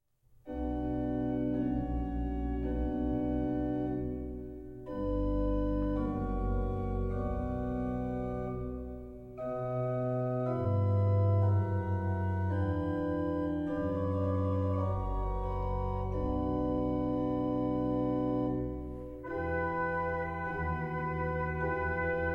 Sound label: Sonate D-dur, Andante maestoso; Henry Purcell